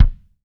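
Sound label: RAW bass drum, Drums Acoustic; AKAI; 90s sample CD; USB Soundscan vol.10; RAW KIT 5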